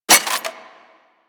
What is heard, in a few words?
Burst Rifle Mech; Weapons; Grineer